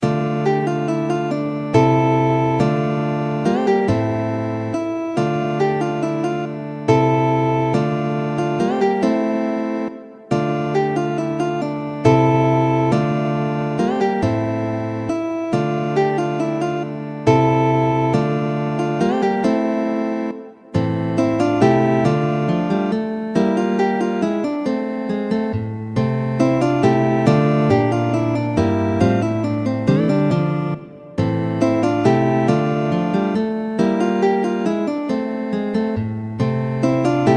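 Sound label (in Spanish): minueto, guitar; visee robert de; suite en re menor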